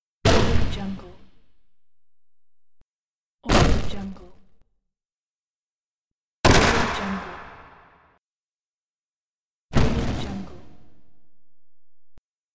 machine foot step; audioefx

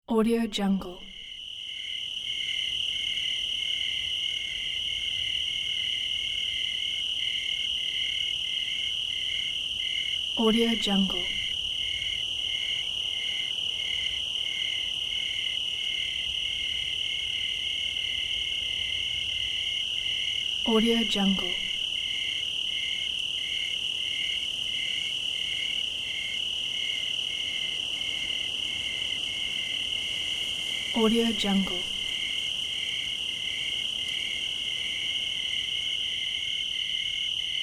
night ambience

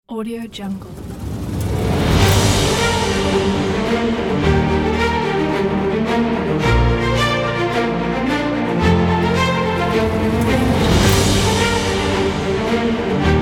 epic; pianissimo-composer

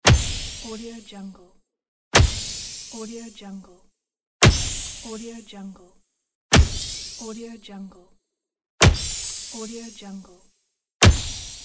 magic punch

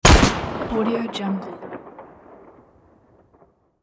guns shot

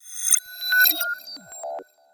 subtle powerdown; soundmorph

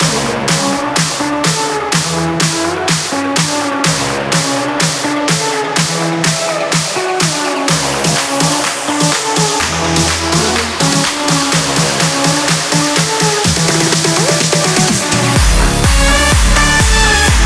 this is edm

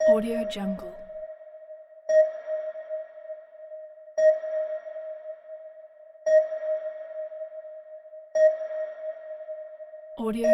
sonar; fx-library

